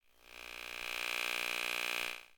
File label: electronic movement; sonniss gdc